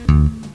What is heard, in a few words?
bounce; Rotate 1.1